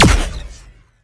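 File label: Sci weapon Shot Medium Laser Proton Popper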